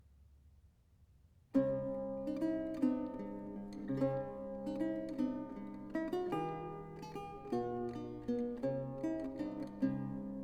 Lute Solo; Packington's Pound

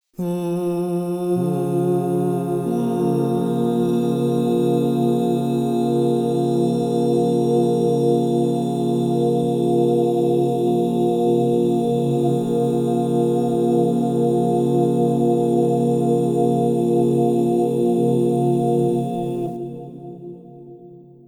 Si bemol minor, crystal bowls; Yoga and sound

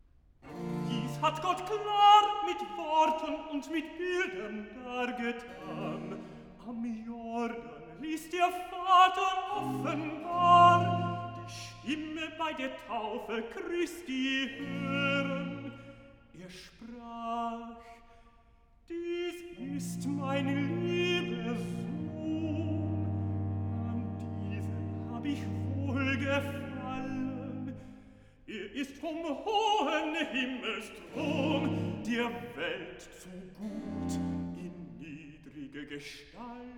Recitativo (Tenor); cantata; BWV 007; Christ unser Herr zum Jordan kam; Dies hat Gott klar

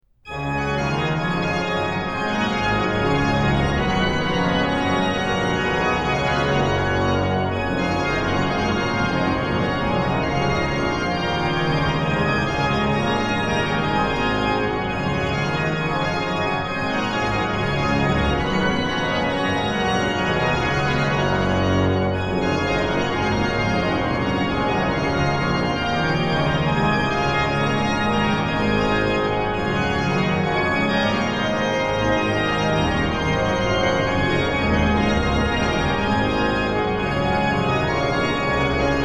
Es ist das Heil uns kommen her, organ; BWV0638; BACH; BACH ORGAN WORKS; CHORALE PRELUDES

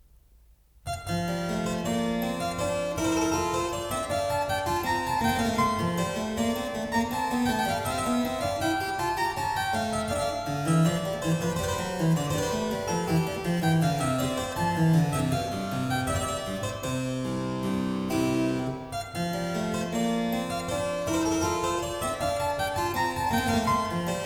Courante, CLAVIER; ENGLISH SUITES; English Suite No.4 in F major; BWV 809; BACH

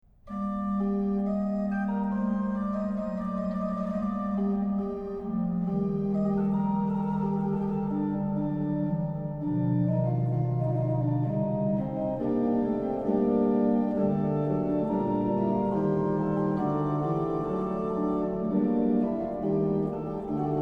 Auf meinen lieben Gott, organ; BWVdeest; BACH; BACH ORGAN WORKS; CHORALE PRELUDES

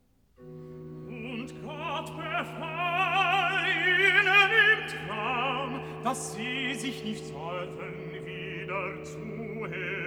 Recitative; Cantata #6; Und Gott behahl ihnen im Traum; Bach; Christmas Oratorio, BWV 248; Ph. Ledger

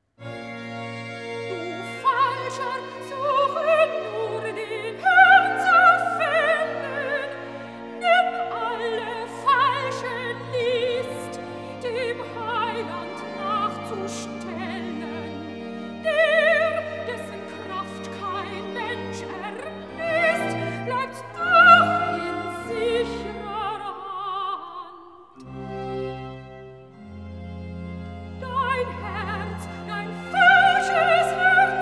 Recitativo (Soprano); Du Falscher, suche nur den Herrn zu fдllen; BACH; Christmas Oratorio

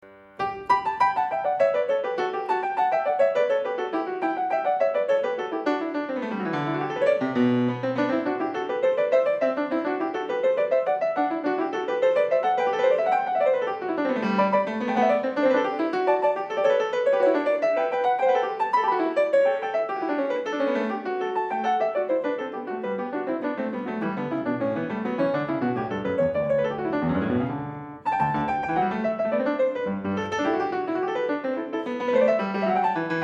Bach Goldberg Variations a 2 Clav, PIANISTS

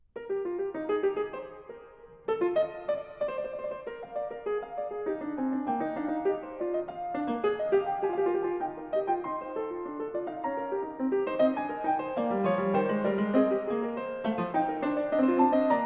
Fugue, E flat major; BWV852; Bach; Well-Tempered Clavier; Book 1

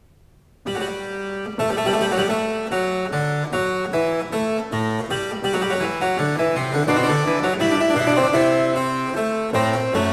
Variatio 10 Fughetta a 1 Clav, Cembalo; BACH; Goldberg Variations

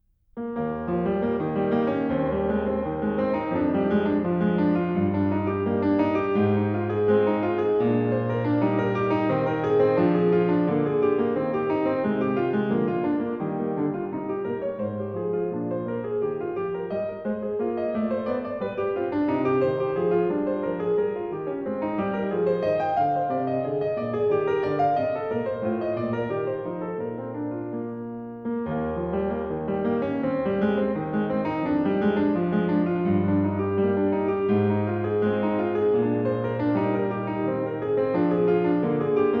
Allemande, KLAVIER; French Suite #4 In E Flat; BWV 815; BACH

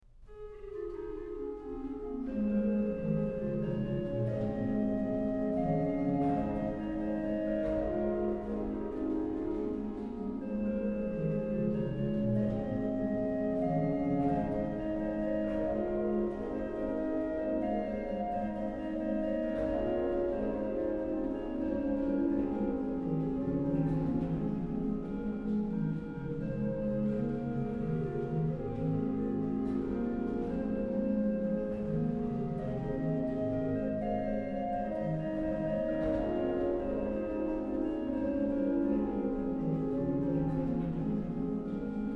organ; BWV0608; In dulci jubilo; BACH; BACH ORGAN WORKS; CHORALE PRELUDES

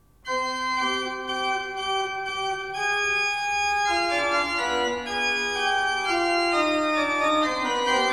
Fugue in C minor, ORGAN; BWV 537; Fantasia and Fugue in C minor; Bach; Organ Works

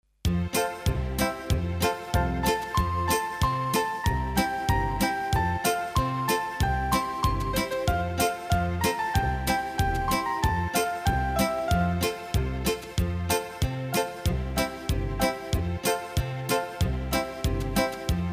Instrumental, karaoke, Bulgarian

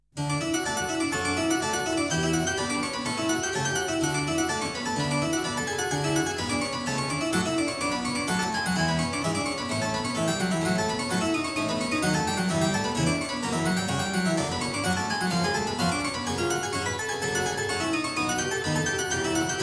Prelude in D major, clavier; Prelude & Fugue No.05; BWV 850; BACH; WELL TEMPERED CLAVIER; BOOK I

